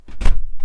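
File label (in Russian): захлопнулась дверь кабины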